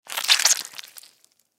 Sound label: Surgery Incision Scalpel Squishes Guts; Hospital; Emergency